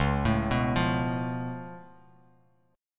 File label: Db Augmented 7th Db down Bass Standard; arpeggiated